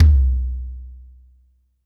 Floor Tom L, Standard Kit, Yamaha PSR-E323

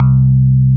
KEY FM, FM Clavi

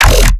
bass hit Piggy Bass E; Virtual Riot

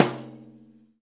Pandiero, Tambourines

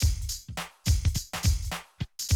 Classic DrumLoops

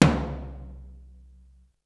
Tom, Drums; ZERO G - Datafile DISC 3

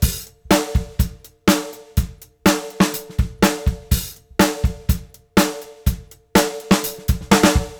Yellow cab 123; Zero-G; Monster Beats

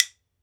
WOOD BONE, Ireland

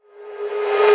Reverse Synths G#; Vengeance - Essential Clubsounds Vol.4

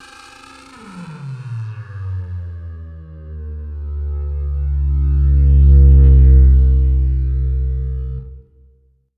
DESTROYER, Anime Sound FX, SYNTHETIC; AKAI Anime Sound FX

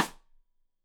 Side Stick Stereo; Deeply Sampled Drums; SMDrum

